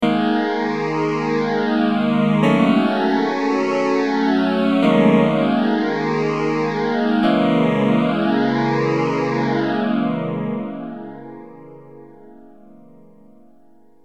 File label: m1000 phase100